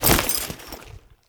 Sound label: Foley Step; Warframe; Characters; Kahl